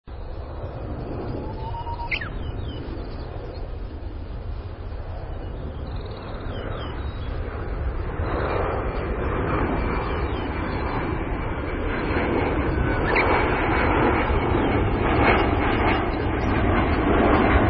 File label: big bird